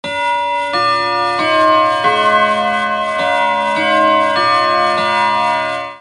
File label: Old Clock